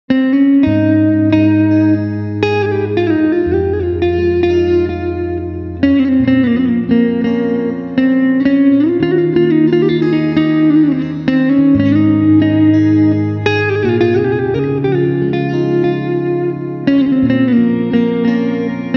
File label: Guitar Slow